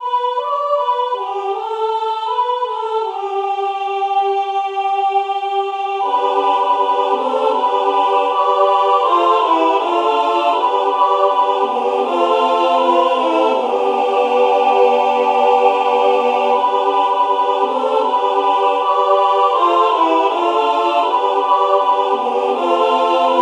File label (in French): branle 3 voix; negue buerueko bralea